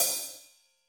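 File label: hi-hat, Drums; Bob Clearmountain Drums I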